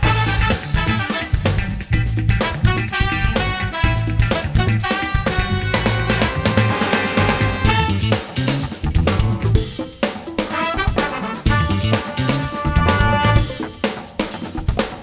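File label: Music Menu Loop; Pictionary; NDS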